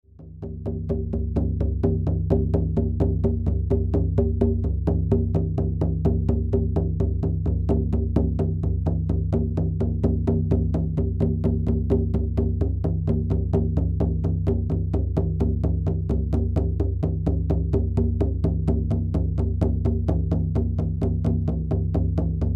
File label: ddrum, shamanic music